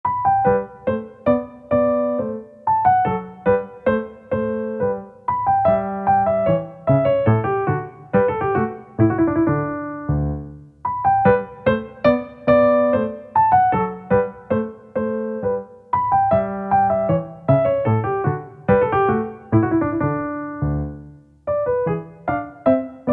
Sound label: Minuet in G Major, piano; K. 1; Mozart; 20 Piezas Simplificadas para Piano